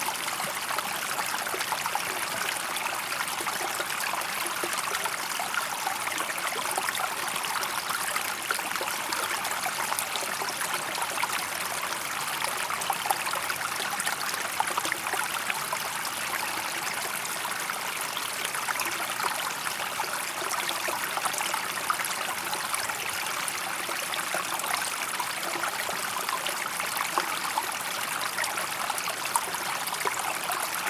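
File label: small river, ambient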